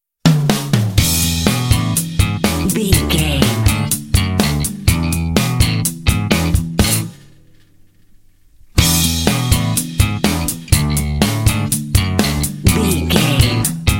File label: indie pop jams d and b and acoustic gtr